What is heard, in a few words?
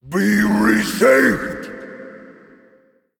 Male Titan Attack